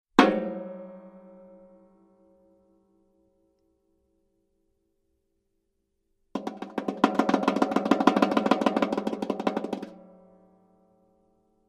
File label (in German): trommeln dissonant, banjo